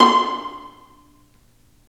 Vas Pizz, String; Vlas Pizz; Roland - String Master Series

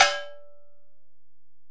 Ac Perc, Drum Machines Alesis DM-PRO

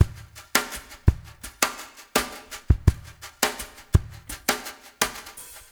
SNT DR CMP, Drums; Spectrasonics; Liquid Grooves 1 & 2

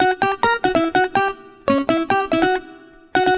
guitar, stringed instruments

